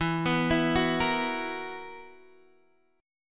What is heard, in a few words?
B Major 7th Suspended 4th, guitar chord